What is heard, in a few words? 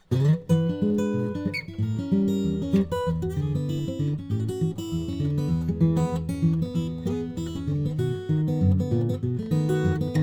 Model J Cocobolo, guitars; Gene Bush